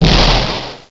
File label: damage